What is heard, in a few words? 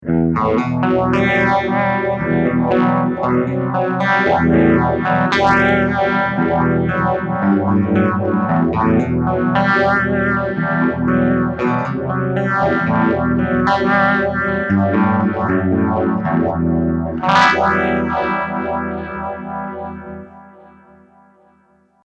Rock